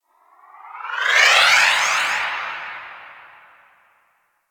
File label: Portal Random E; Crp Exterior Gln Interior